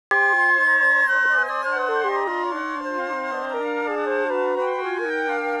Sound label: Six Flute Melodies